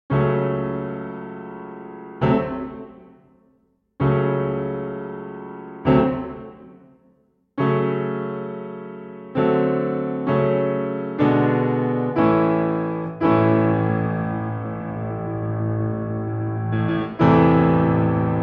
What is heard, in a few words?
4 Hands Piano; Finlandia, Op. 26; Ganz